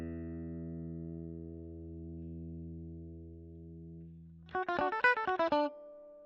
E GTR; Gefell

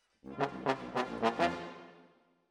Trombones, jazz for piano

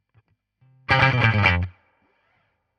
Guit Solo, RNR for piano

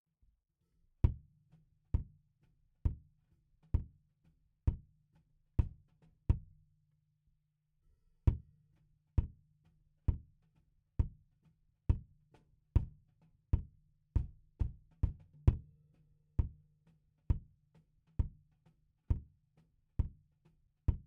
Kick In

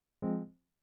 Keys

